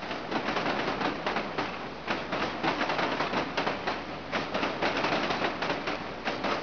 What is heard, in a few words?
interlocking